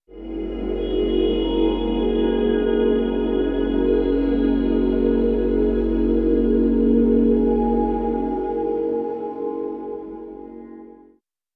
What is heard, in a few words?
Low Complex, Complex Wave; Sonic Foundry (Sony Creative Software) - James Johnson Spektral Minimalism